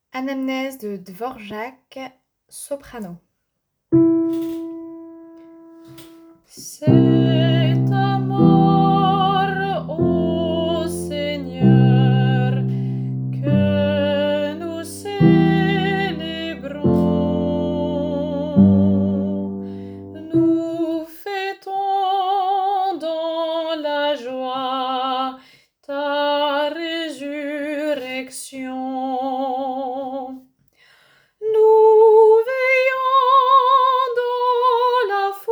Anamnèse Dvorak soprano

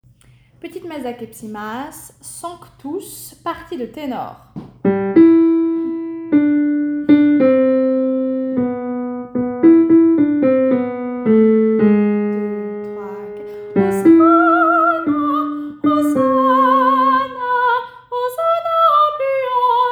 Petite messe Sanctus tenor; Akepsimas

